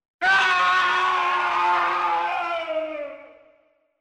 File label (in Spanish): grito de horror de hombre; SONIDOS TERROR